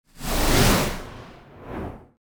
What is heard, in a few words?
Whoosh Strong Emission Shorter; Hollywood Edge; sound designer's toolkit v2